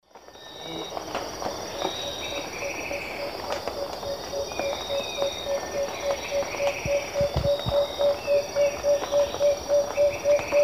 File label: Megascops watsonii, song; S. America; Eastern Lowlands